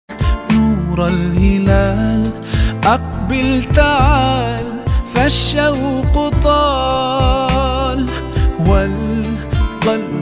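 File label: English islamic ringtone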